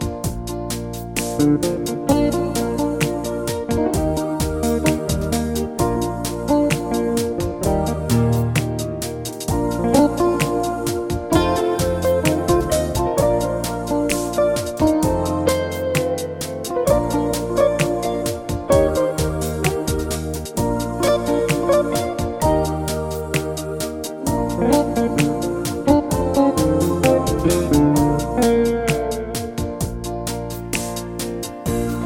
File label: Crying Guitars